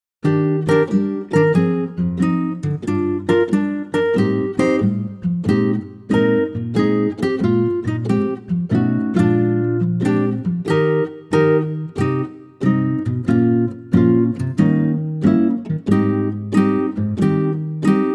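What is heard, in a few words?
acoustic guitar Eb; key - Eb - vocal range - C to Eb; Superb acoustic guitar arrangement of this wonderful classic standard.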